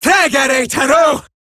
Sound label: attack, female; Warframe; Eximus Grineer Female; Battle Barks